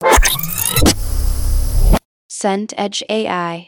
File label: zip transition